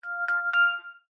sonar ringtone, Samsung SMS ringtones

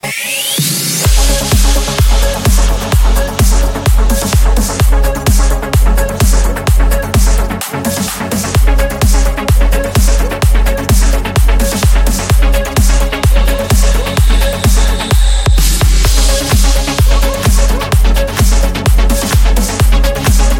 edm ringtone

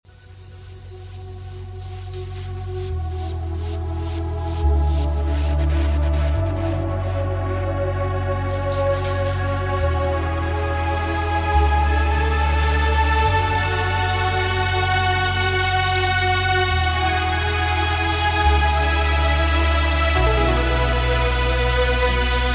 new trance

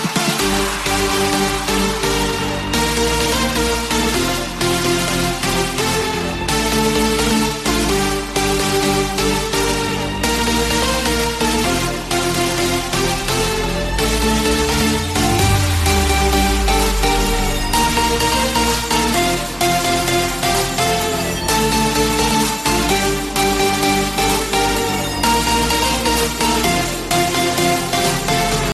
heavy metal piano ringtone

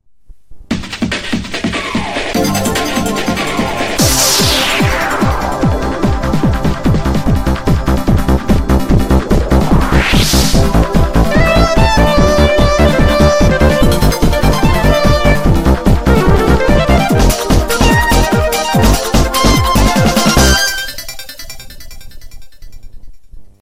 euro house ringtone

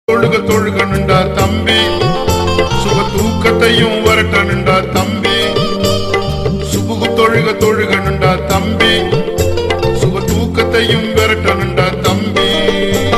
subuh tamil ringtone, islamic

